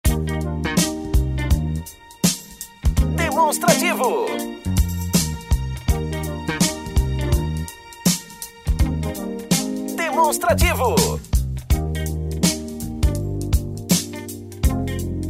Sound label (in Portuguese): tema espera